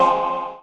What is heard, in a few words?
choir stab; Weird and Interesting Hits; single hits